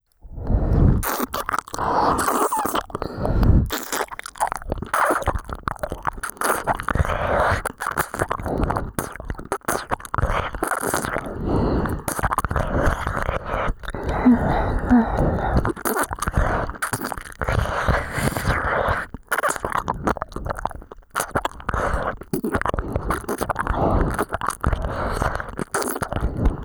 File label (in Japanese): 脳バグ耳奥舐め（左）ループトラック, ASMR